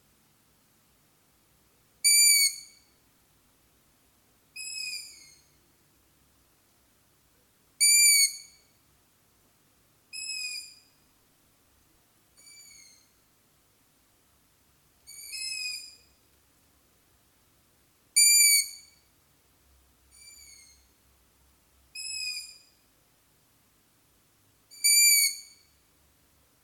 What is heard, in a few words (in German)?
Waldohreulen Rufe